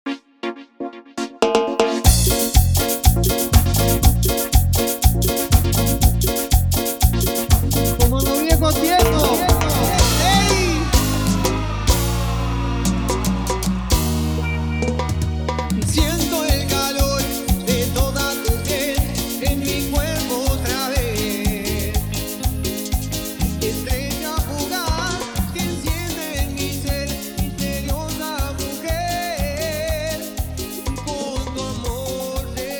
En VIVO, Intro, Clean, 120 BPM

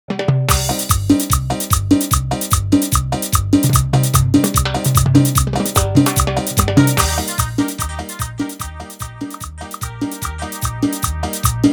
Instrumental, BPM 148

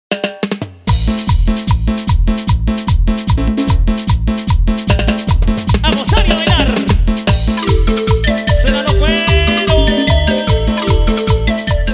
Instrumental Chicha, BPM 150

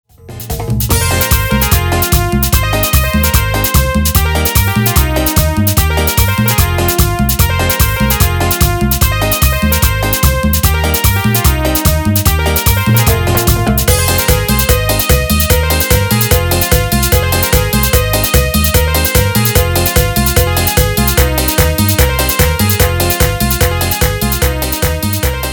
Instrumental Chicha, BPM 148

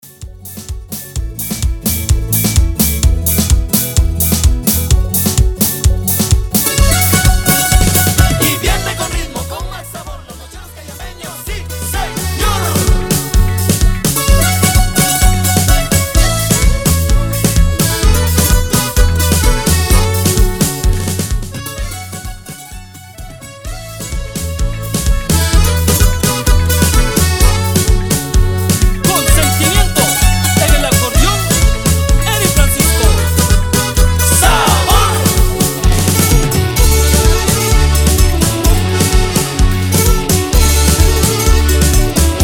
Intro Simple, 128 Bpm

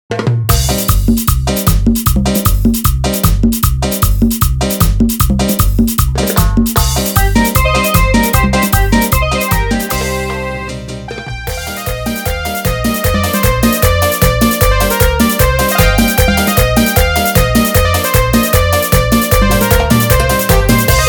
Instrumental Chichero BPM 153

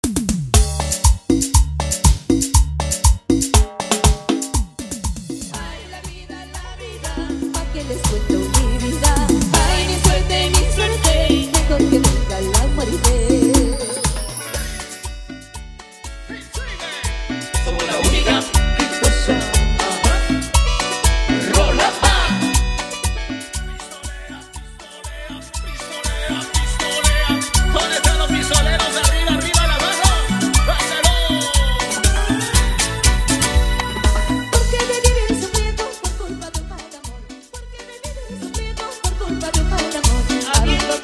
Acapela, 120 Bpm

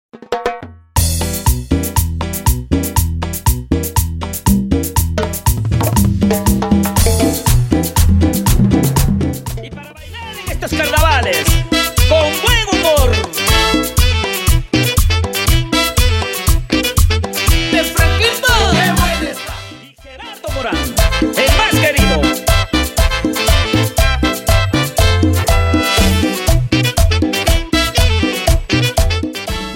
Intro Simple, 120 Bpm

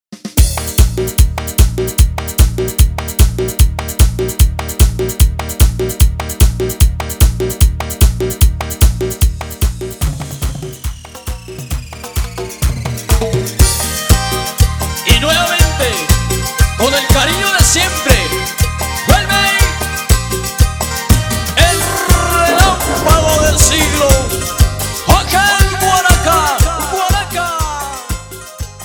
Transicion 150 a 120

